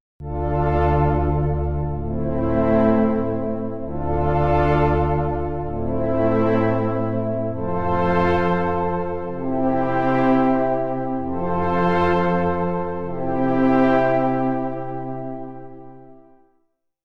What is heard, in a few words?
Influx Pad